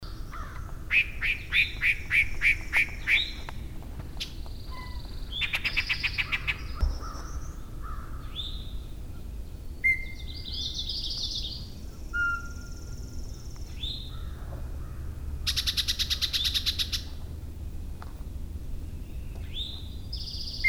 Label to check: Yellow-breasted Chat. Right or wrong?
Right